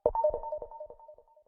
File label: chime